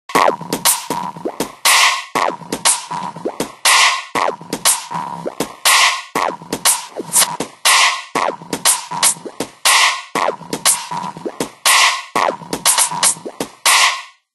Ring Gritty Beat; HTC Touch Viva WM6.5

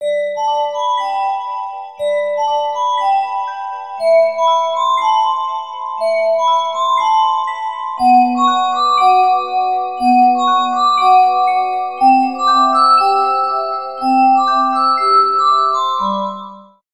Alarm Vol Short relaXation; Sony Ericsson; X1 Family 2; PlusFourSix; Venus; Lars Edvall

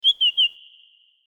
Song Thrush Fresco, notifications; Vertu; Signature-Touch

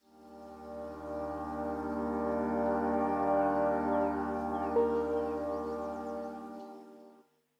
wakeup, alarm prewakeup; Mudita Pure

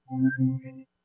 Beaming End; Samsung SGH-i320; System Sounds

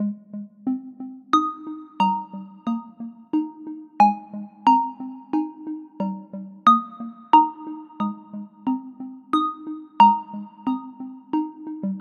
Drift, alarms

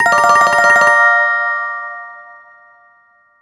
Lively; BlackBerry; OS-10

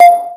Video Record, ui; Huawei Y320 U10

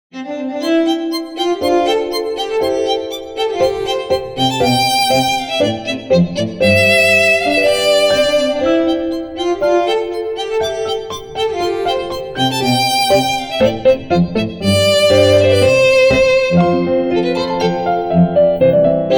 Elegant Springtime, ringtones; LG K92 5G

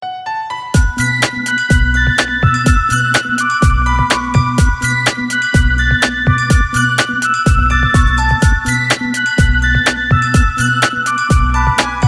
phonetones Nokia

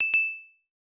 camera timer last; LG Viper LS840

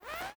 Unlock, phone ui; OnePlus; 8T Cyberpunk 2077 Edition

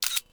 Burst Slow; Asus Padfone-2

